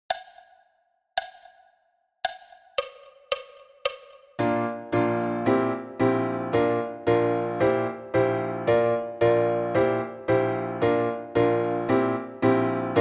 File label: Parameters a 112 bpm